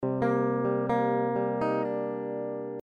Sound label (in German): netter gitarrenfill clean